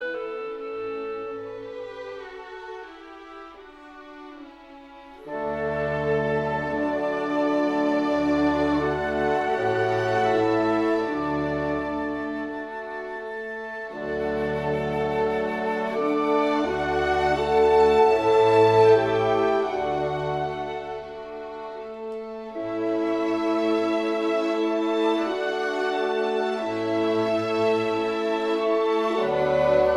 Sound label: Adagio for klarinet; Wagner